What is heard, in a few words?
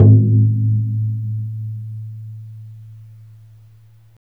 DA PAI GU, CHINESE PERC; Spectrasonics - Heart of Asia CD2